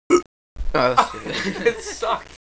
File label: bad burp